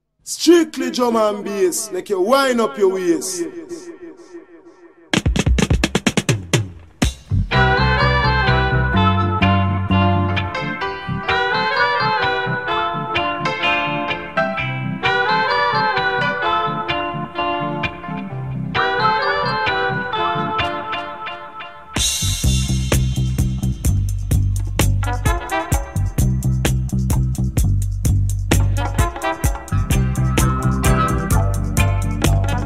slow heavy dub reggae music

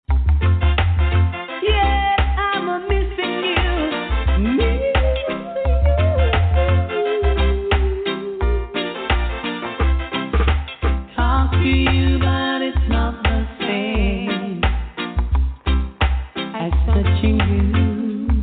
reggae mix; top artist 80s 90s

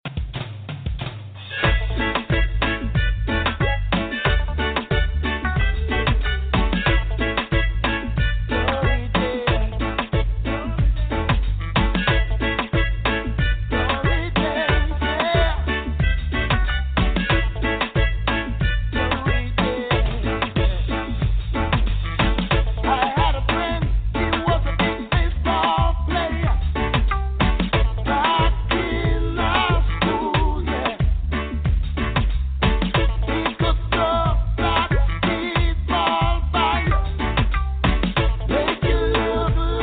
just reggae music rasta vibration; monday secession

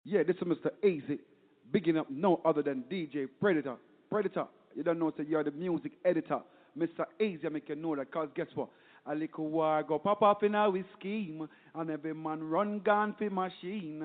smooth reggae lovers rock; mixing up the midnight lovers mood

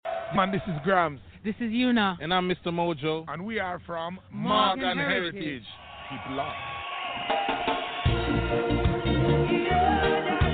live reggae concert; lunch time reggae

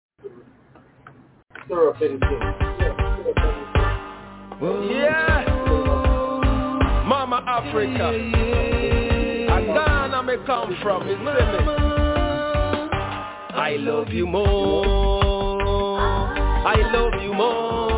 socially conscious reggae music; reggae wednesdayz

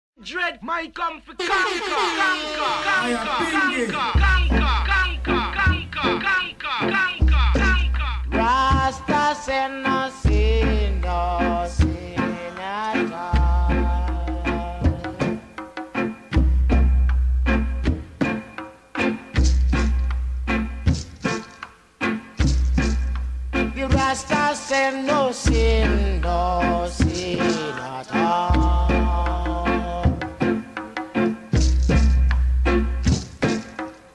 chanting nyabinghi rasta drumming and praise

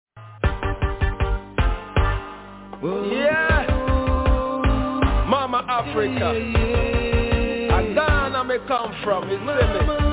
lovers rock reggae; reggae wednesdayz

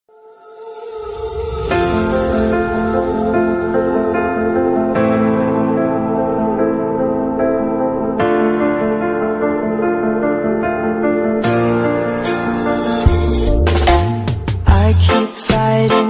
classic reggae female artist hits

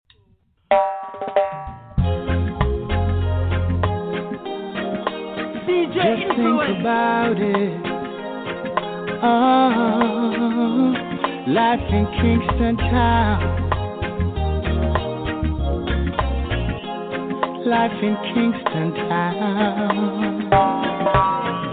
jamaica positive vibes reggae hit songs

